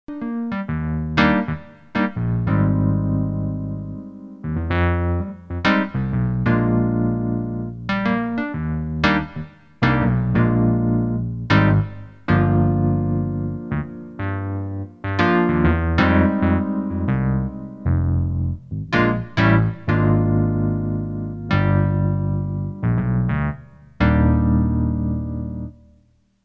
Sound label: E Piano